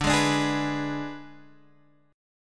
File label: Ab Diminished D, Guitar